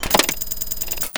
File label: steampunk mechanical gear chain element start stop; Steampunk Mechanical Sounds; BluezoneCorp